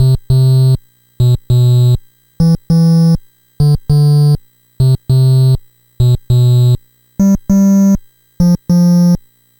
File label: NES Bass, chiptune